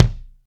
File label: silky kick drum sample a sharp key; kick drum samples